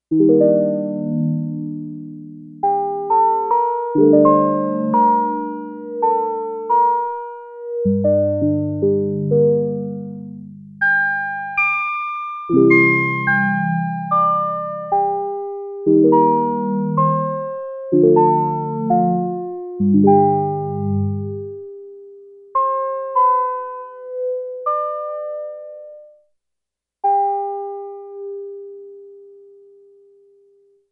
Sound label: Percussive Electric Piano